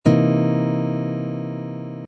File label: c dominant seventh sharp ninth chord voicing, guitar